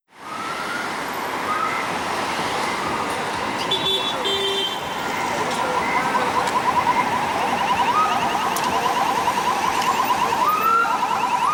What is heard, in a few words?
Siren Pulse